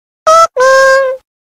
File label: pikmin noise